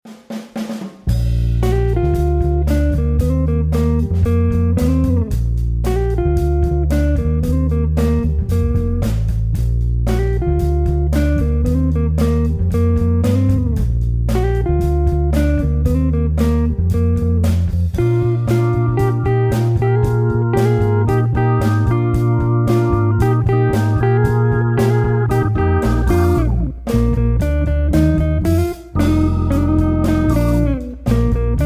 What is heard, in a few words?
Melody